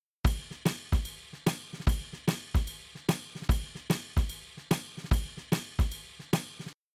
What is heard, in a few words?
Drums, shuffle drums; WDR2